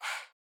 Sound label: Chants